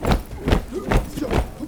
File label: HUM RUNIN, Foot & Mouth; Stompin'Feet; Roland LCDP14 Africa VOL-2